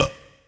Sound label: burp short